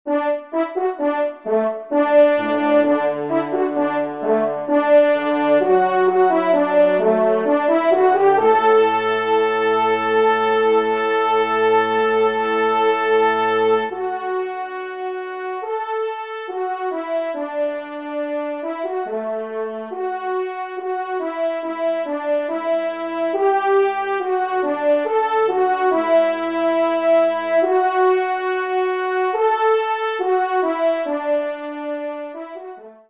trompe; La Chasse Joyeuse; J Pattein

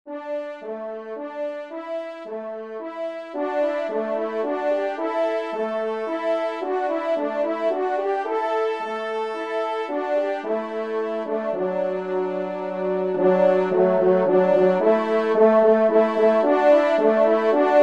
menuet, trompe; 2e Trompe; Dominique Dupoiron

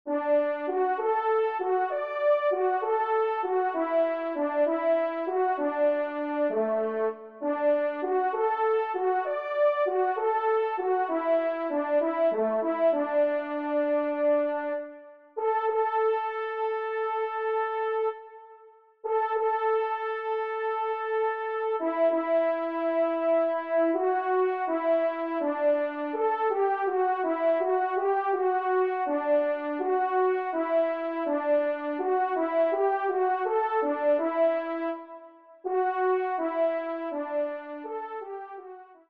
Variation trois Trompes

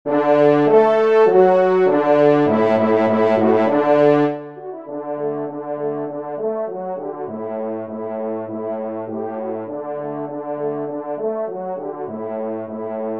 trompe; Le Veneur

